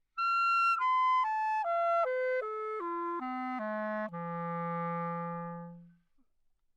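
classical clarinet c, arpeggio descending, Anechoic; OPENAIR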